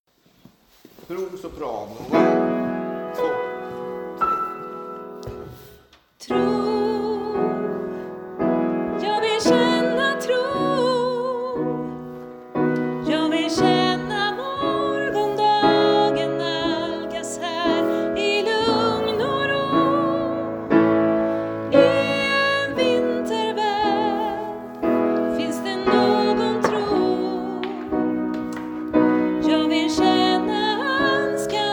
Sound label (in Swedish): Tro Sopran